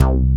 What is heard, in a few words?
low end bass; Masterbits; Dance Trax